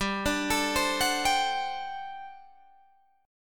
G Major 7th Suspended 2nd Suspended 4th G down Guitar Open G